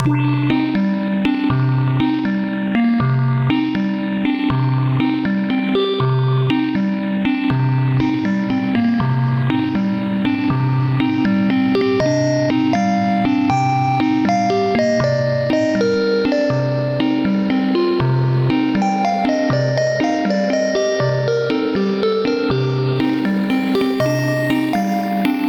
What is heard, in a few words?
Freeze Marimba; Fusor Preset KB